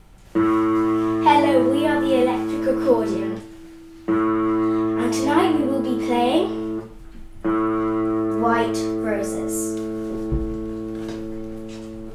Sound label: electric accordion